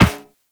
Run Metal Perforated, Footsteps; Warframe; Characters; Operator

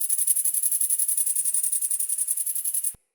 Meadow Close Grasshopper; Day Animals

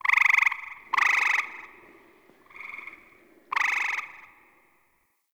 Night Tree Critters; Warframe; Eidolon Plains; Ambience

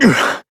Pain, Adult Operator; New War

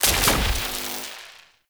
Shock Enemy Impact

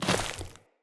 default step, steps; Sprout Farmer

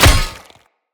Great Sword Impact Flesh; Weapon Impacts; Founders Great Sword; Combat

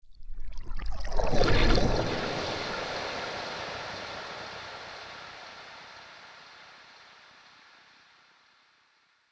trippy lap, ambience; Soul Plane

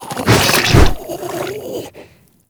Infested Ancient Strike Flesh; Warframe

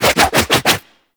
Melee Charge Spin Whoosh; Warframe; Wraith

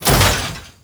Forest Piston; Props; Warframe; Grineer Forest

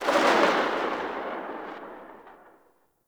Pistol Tail EXT B; Tenno; Sybaris

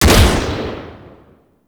Tiberon Prime Shot A, Rifle; Warframe; Tenno; Tenno Drake Rifle

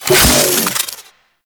Hack Out; Parazon

